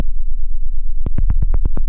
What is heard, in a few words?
techno deep bass section; instruments; loops